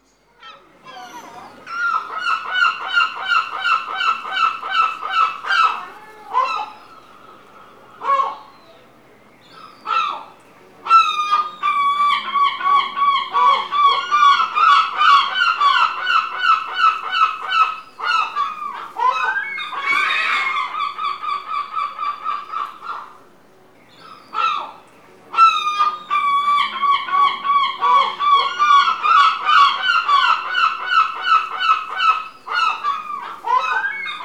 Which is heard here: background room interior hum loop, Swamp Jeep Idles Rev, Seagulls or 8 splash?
Seagulls